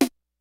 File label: kicks snare, kid; Kid Nepro; Elite; KICKS SNARES